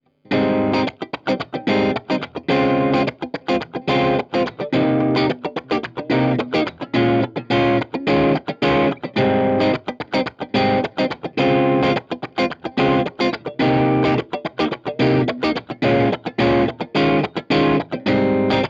dry Electric Guitar

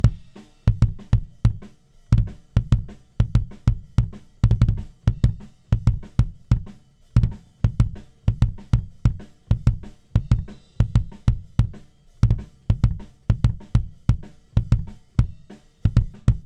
wet Kick

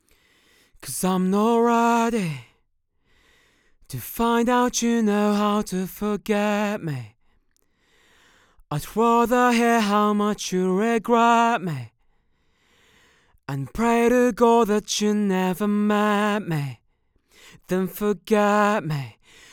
dry Vocal Male

